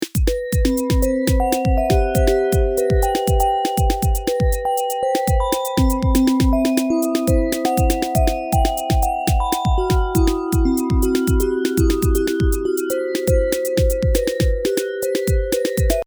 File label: melody